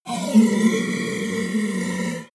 yeti groan pain long; kreaturen

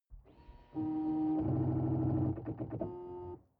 sports car ext ignition onoff; verkehr-transport

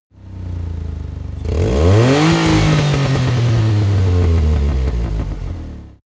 car buggy rev up

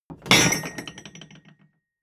wood impact metal rattle hard

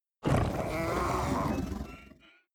fishman grunt; kreaturen

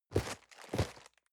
cardboard box medium turn; objekte

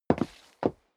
step sneaker wood parquet scuff; schritte

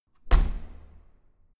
corridor door close hard

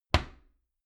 door wood close regular

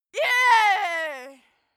female cheer

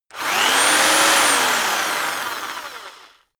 rock drill rev medium long

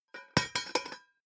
pot lid drop close; objekte; topf

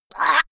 monster choking high; comedy-cartoon; soundpool

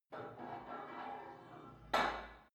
put weight onto barbell reverby; sport freizeit; fitnessstudio

